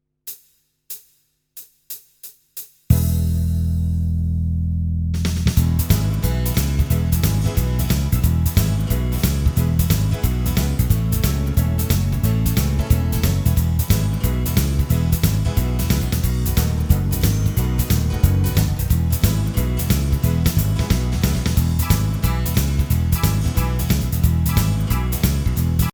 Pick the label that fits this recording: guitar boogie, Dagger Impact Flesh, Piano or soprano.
guitar boogie